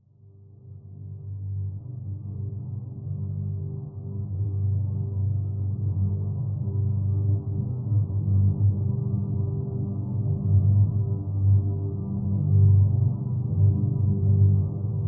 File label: Drone Bed Atonal; Scifi Production Elements; Lynne Music